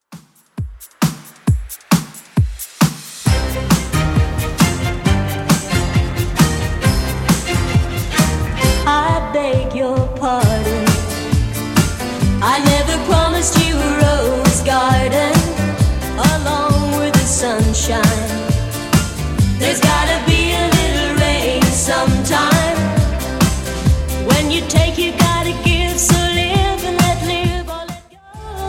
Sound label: 70s Redrum 134bpm